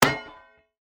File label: IMPACT Bullet Metal; mono; UltimateFishing